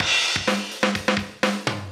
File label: PERCLOOP HOUSE 125; eJay